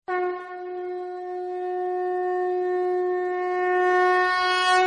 Shankh

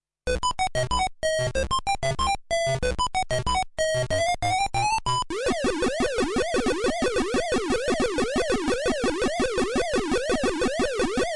playing pac-man

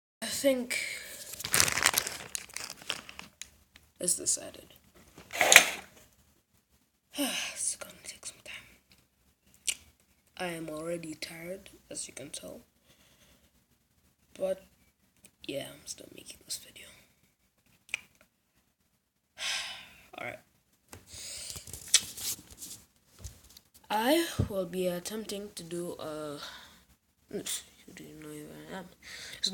longest yeah boy ever

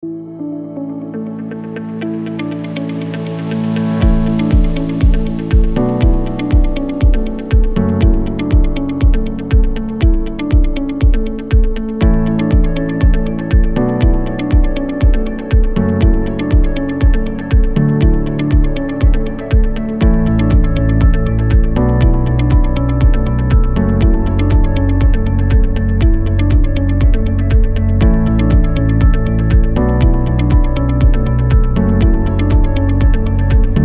bgm

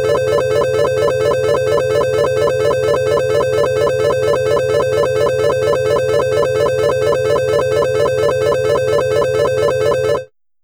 classic winner alarm

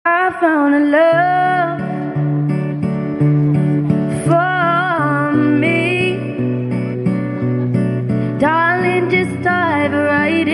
song

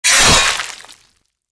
Sword Stab Sound Effect

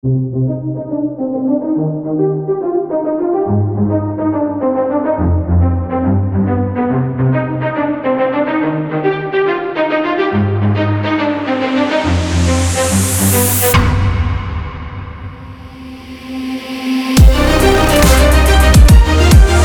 epic cinematic trailer

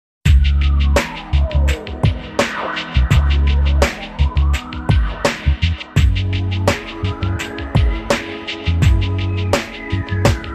remix; Darbari

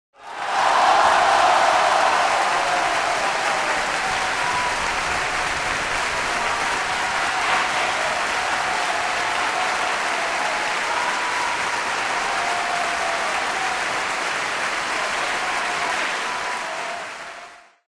crowd cheer sound effect

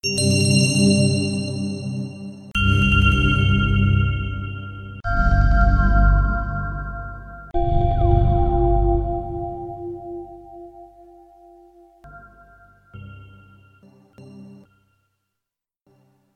game over sound

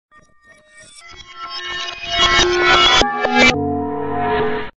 time warp sound effect

